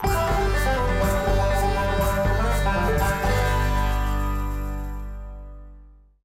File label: Quest Race Win